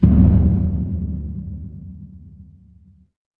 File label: transition braam